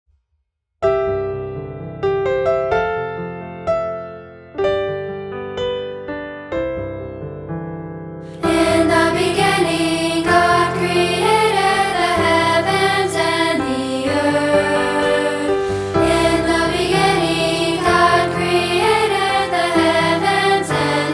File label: piano